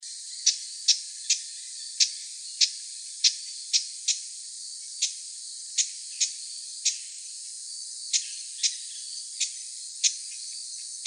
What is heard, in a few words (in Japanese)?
uguisu